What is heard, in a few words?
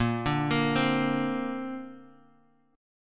A sharp Major Add 9th, Guitar, Open F; arpeggiated; JGuitar